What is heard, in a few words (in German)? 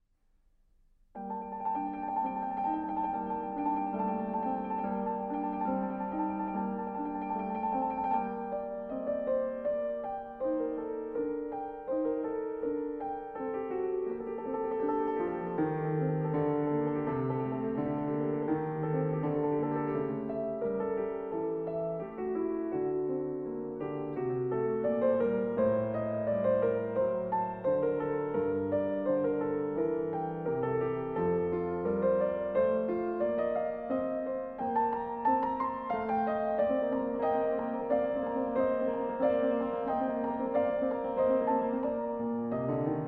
Preludium g moll, piano